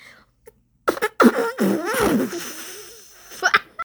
fart

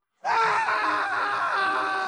mobile game ad scream

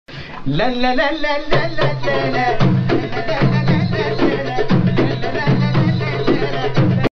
lalalaa

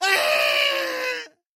monster mischievous emote scared scream long; articulated fun; diablo

